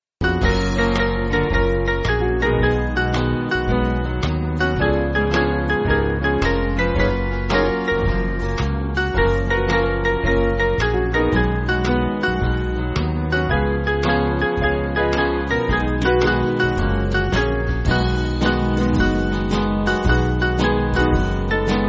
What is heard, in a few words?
Band